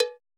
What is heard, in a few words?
perc, Roland MC-909